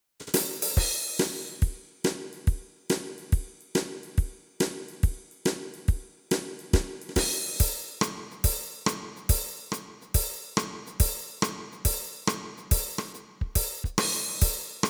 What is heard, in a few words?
beat big band, Startone MK-300